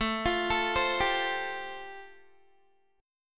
A-Minor 7th Sharp 5th, Guitar, chord; 17,20,19,17,19,x; arpeggiated; Am7#5

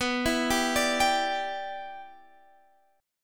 B Suspended 4th Sharp 5th Add Sharp 9th B; Bsus4#5add#9; arpeggiated

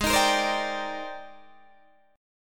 Ab Major Sharp 11th Ab, Guitar DADGAD

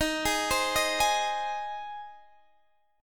C Minor Sharp 5th, D sharp, chord

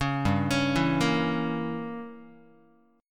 G Diminished 7th Guitar, Gdim7 chord; arpeggiated; JGuitar

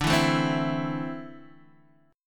D 7th Suspended 2nd down Guitar DADGAD; D7sus2; strummed

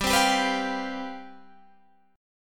C Major 7th Suspended 4th, G, guitar chord

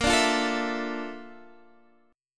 A sharp Major Sharp 11th, chord; strummed; chordshape; x,13,12,10,10,12

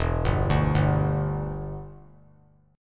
E Minor 7th, down; arpeggiated

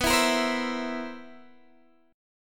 C 7th B, chord; C7/B; strummed

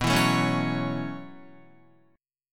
B 9th Suspended 4th down Guitar 4F,4A,5C,5G,5C,6E; B9sus4; strummed; JGuitar

